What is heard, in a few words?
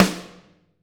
HALL SN, HALL SNARE